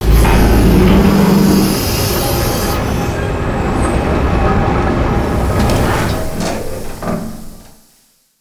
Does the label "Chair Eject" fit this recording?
Yes